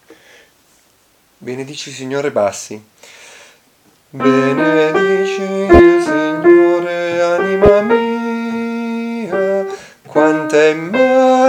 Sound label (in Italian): bassi; Benedici il Signore anima mia